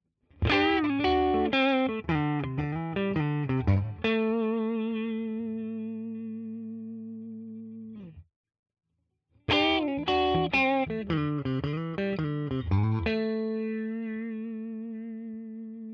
Schecter Exotic Ziricote clean Blueslick all positions